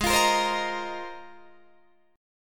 G sharp 6th Add 9th, Guitar, Open D

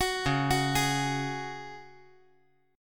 Db Suspended 4th Db; arpeggiated

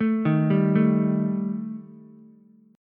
G Suspended 2nd Sharp 5th G Ukulele; Gsus2#5 arpeggiated; JGuitar

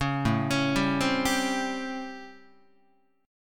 A 7th Sharp 9th, Guitar; A7#9; arpeggiated; JGuitar

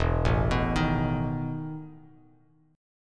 E Major 7th Suspended 4th Bass Standard; EM7sus4 arpeggiated

